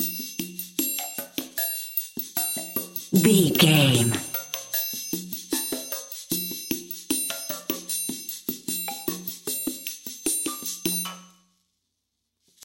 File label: top secret mission percussion loop